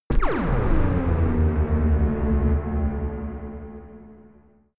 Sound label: high energized laser blast; krieg-waffen; soundpool